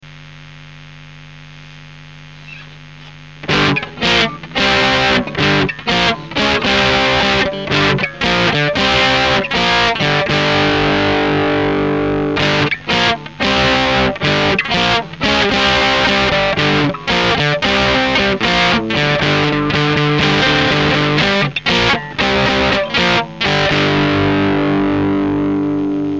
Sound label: lead; kittyhawk quattro